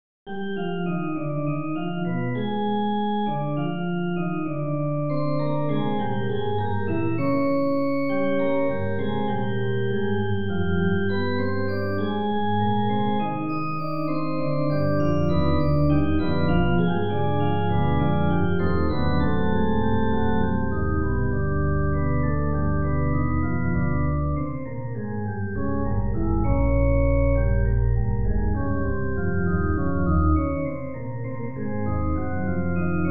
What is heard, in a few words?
fughetta minor; umezawa sadayuki